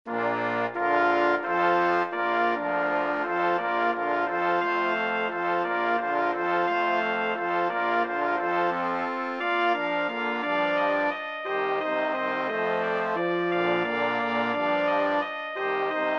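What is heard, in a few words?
Hornpipe, Brass Quintet; Hormpipe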